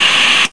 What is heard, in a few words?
TV static; Virtual Graceland